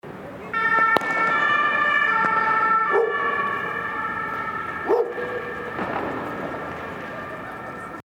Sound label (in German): Silvester Martinshorn